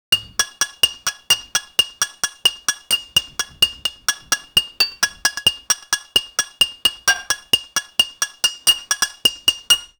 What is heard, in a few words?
game blocks colliding